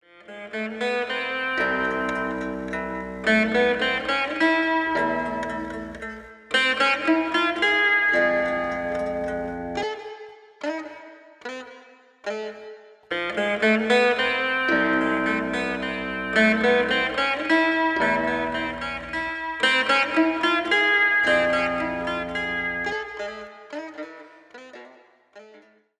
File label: Symphony in Raga Dhautuvardani